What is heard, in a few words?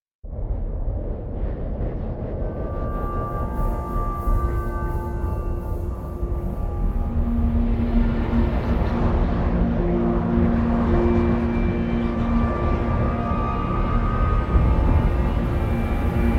Hyperjump Countdown; Gothic Storm Music